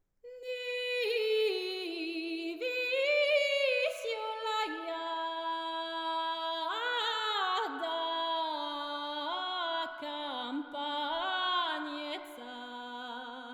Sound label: Russian Folk Song; Stravinsky Les Noces (1919); Ne vesiolaïa...